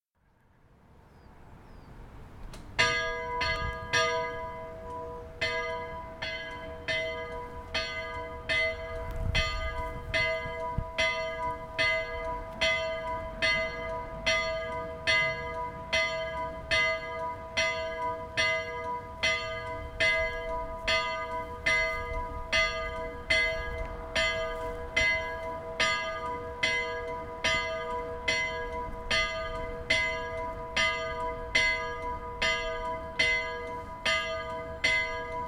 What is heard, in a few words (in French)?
cloche; Willisau St-Nikolaus cloche-2